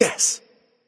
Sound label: "yay"; smite; merlin